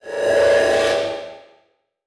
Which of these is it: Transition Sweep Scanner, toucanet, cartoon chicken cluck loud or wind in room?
Transition Sweep Scanner